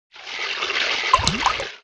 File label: JUG POUR